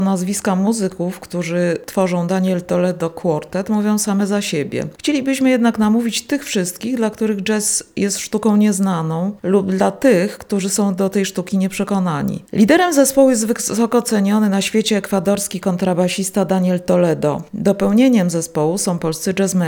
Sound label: piano